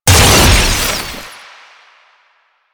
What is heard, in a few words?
Explosive Impact, Weapons